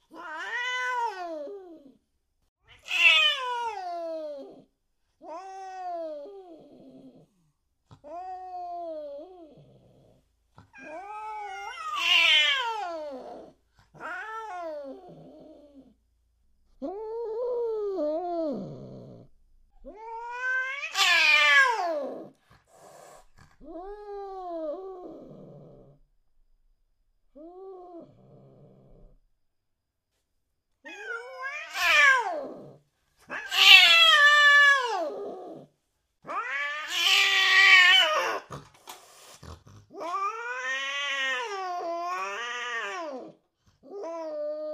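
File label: Cat Fight Meow Hiss Angry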